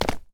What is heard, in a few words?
landing, battle